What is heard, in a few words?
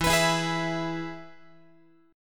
E-Major E, Guitar; strummed; JGuitar